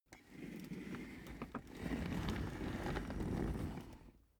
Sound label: office chair trundle slow long